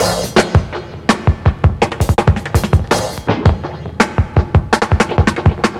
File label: 165 in the gutter, Drum Loops, Drum & Bass; Total Drum&Bass